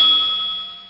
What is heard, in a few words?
ding; blackhol